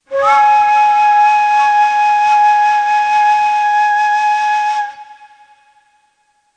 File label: Evc, Ney